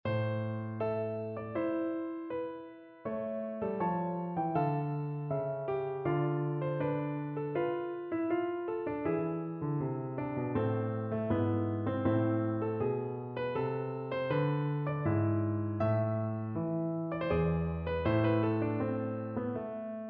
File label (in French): Sonate en La mineur Gaillard clic, tuba